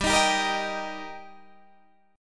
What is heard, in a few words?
G Minor Sharp 5th G, chord; Gm#5 strummed; 15,18,17,15,16,15